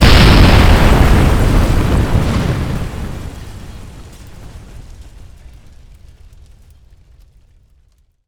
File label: sound explosion large; KSP